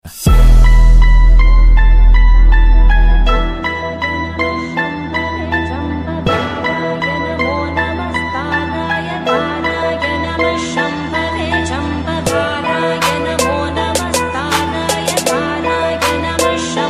Remix Ringtone

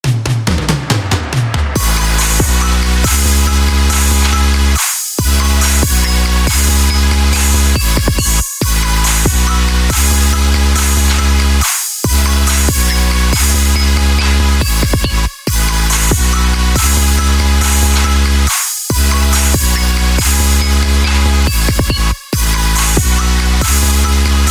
TRAP future bass

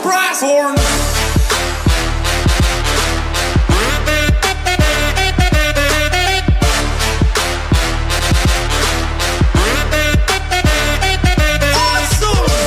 Brass Horn

